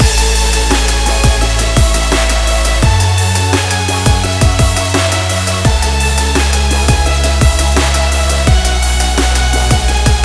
inspiring sport dubstep

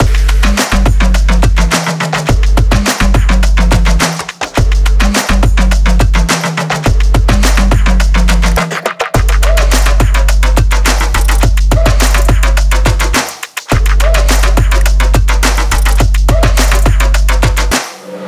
dynamic and energetic boost

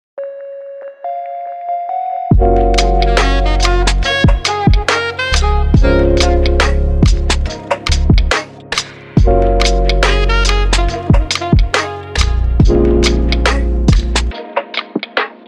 chill meditation

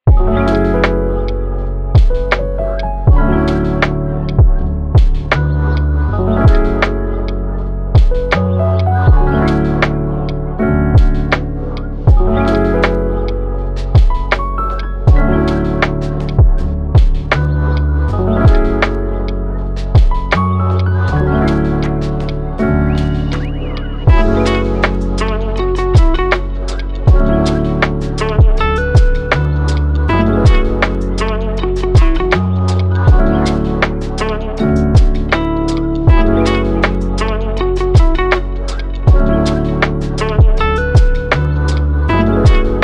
mellow lo fi

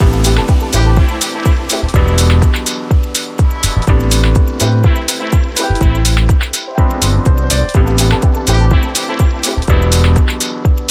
fresh lounge vibes

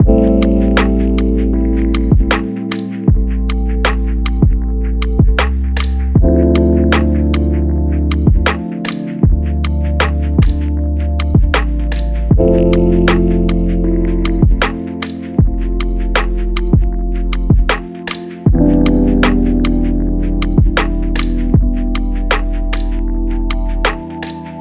meditation zen vibes

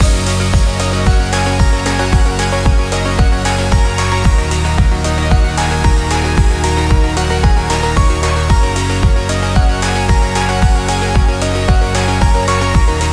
inspiring and fun summer pop loop

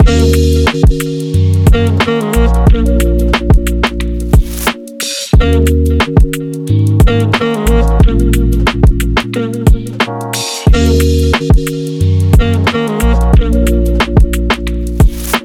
travel vlog

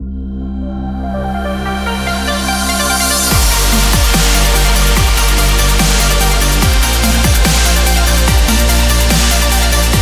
space science dubstep

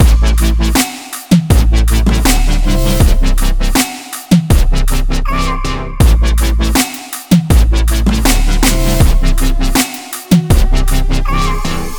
uplifting future bass

